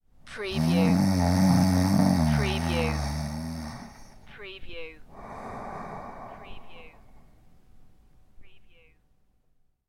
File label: Snoring, Male Snore